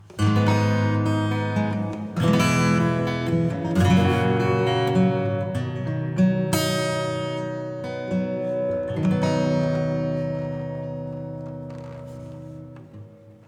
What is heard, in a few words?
Piano Hall EQ